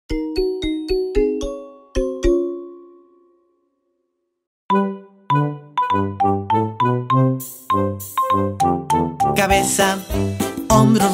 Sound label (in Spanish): Cabeza Hombros Rodillas Y Pies Acelerando Canciones Infantiles; Super Simple